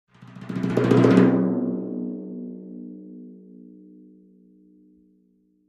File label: Timpani Hands Speedy; mallets timpani